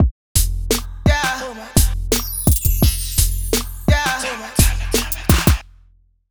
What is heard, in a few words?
HipHop Kit; Steppa